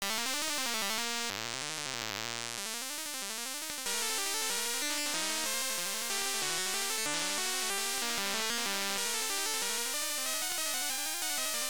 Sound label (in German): Fantasie Xylophon; beeper